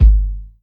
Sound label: wet kickdrum g key, kick tuned to the G note. Loudest frequency: 63Hz